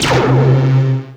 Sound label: obelisk fire